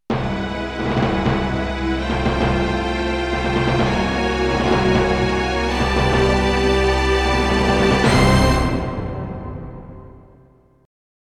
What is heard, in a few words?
Opening Fanfare; Lunar The Silver Star; Mega CD